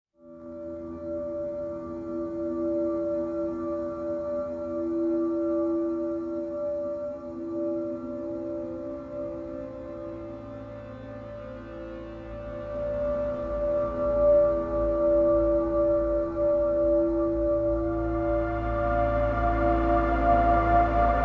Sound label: Ambience Version; Nordic Drama Textures